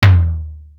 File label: Tom, Drum One Shots; Coldcut's Kleptomania VOL1